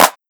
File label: Clap Hard; Hard3eat; pitchglitch